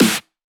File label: Snares; Vengeance Samples